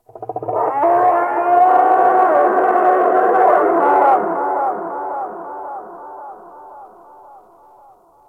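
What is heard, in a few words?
Ocean Various Creatures; Warframe; Grineer Ocean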